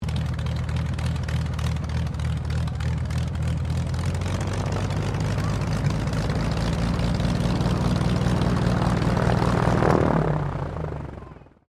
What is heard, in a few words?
AIRCRAFT CT4A TAXI SHUTDOWN, military trainer aircraft; Airplanes